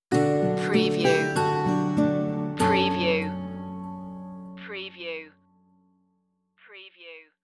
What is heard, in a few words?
stinger